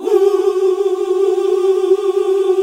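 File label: Gospel; Best Service Gigapack 1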